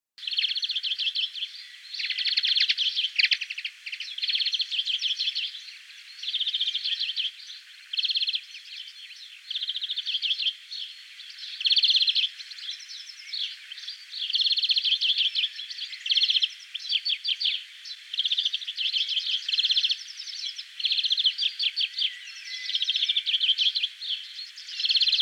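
obyknovennaya zelenushka golos, European greenfinch voice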